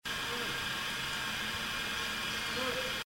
Grunt unfiltered; These two grunts were recorded during the 2004 September BFRO New Mexico Expedition.; Northern New Mexico; submitted by David Letendre

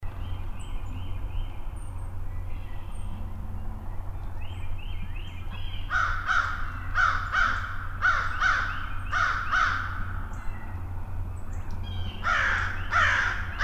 American Crow

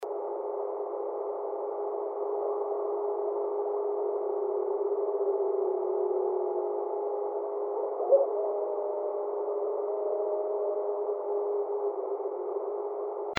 Howl, bigfoot

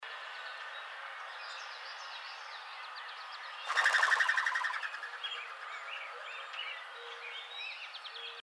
Mourning Dove, birds

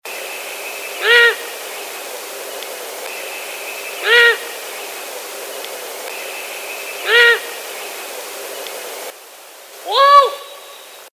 Long-eared Owl

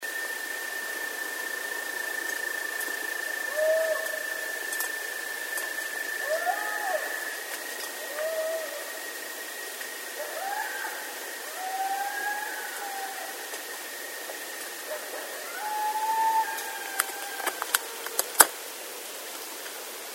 Coyotes; Coyotes (Canis latrans)

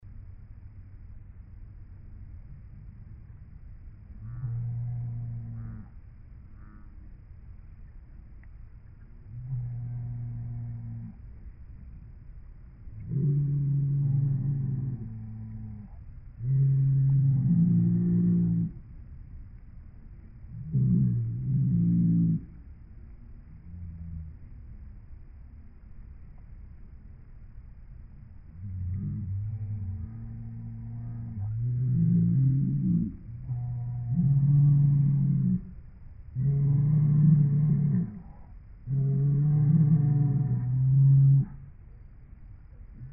Cattle

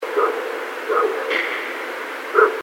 Woodknock

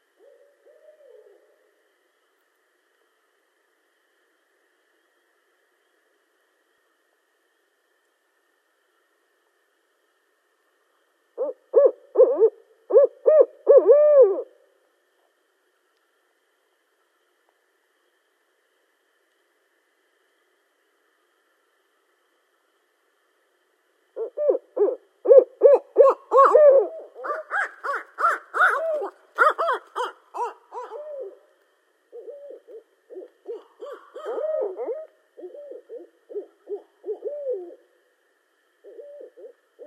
Barred Owl

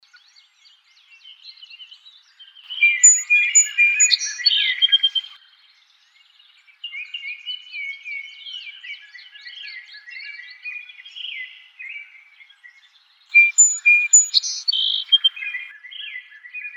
Song Sparrow

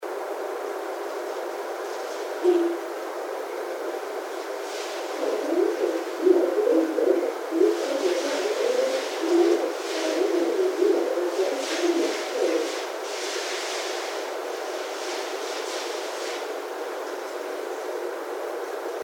voice, bigfoot

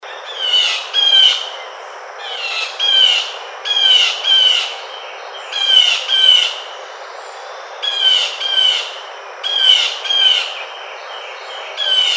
Blue Jay

